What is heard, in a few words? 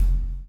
CHAIN; Spectrasonics - Backbeat